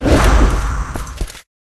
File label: Rat dodge